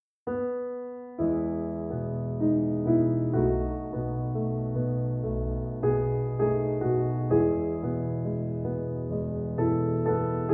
Etude In E Major; Op10 No3; Frederic Chopin (PD)

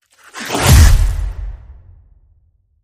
Bike Blitz Ripped Hit

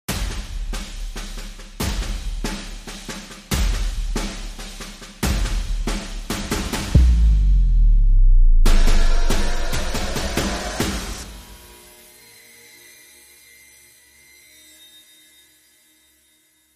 Dramatic Sting Perc

